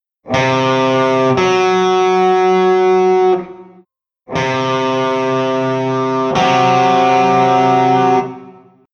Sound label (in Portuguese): Intervalo de Quarta Aum ou Quinta Dim